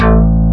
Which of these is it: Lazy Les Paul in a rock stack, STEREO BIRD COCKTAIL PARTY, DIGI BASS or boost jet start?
DIGI BASS